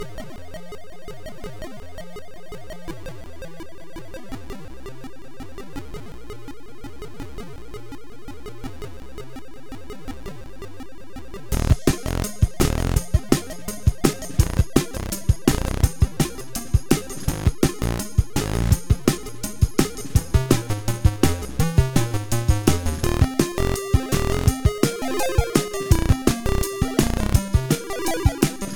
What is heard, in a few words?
Bonus Bubble Spirit PSG Digidrums 100Hz 84bpm